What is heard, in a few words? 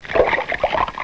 Water, 自然, 水声; 特酷声音素材库